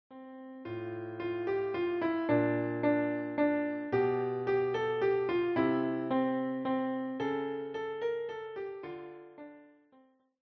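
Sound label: We wish you a Merry Christmas, piano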